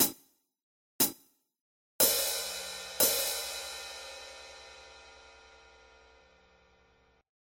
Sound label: hihat